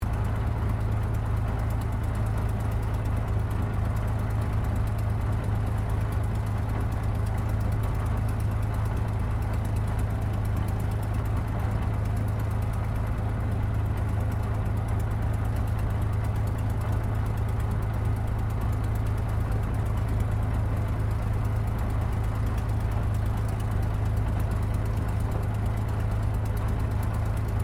AIRCRAFT SUPERMARINE SPITFIRE MK-VIII IDLE INTERIOR; Airplanes; Vehicles; Airplane Sound Effects